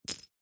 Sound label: leisure game plastic small discs set down; zapsplat